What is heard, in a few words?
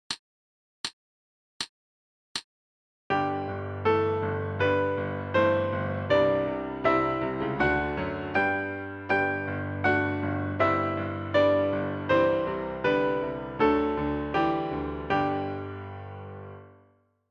G Concert Quarter Notes